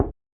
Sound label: knock